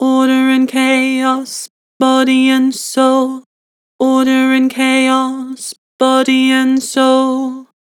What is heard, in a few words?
Vibrato, Vocals; Order and chaos; Harmor Vocals